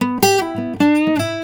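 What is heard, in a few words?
baritone lick E, Blues Guitar; ILIO; Fretworks; 108 BARI LICK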